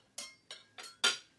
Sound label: ambience room butchery working layer